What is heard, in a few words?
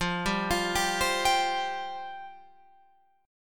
F Suspended 2nd F Guitar Open F; Fsus2; arpeggiated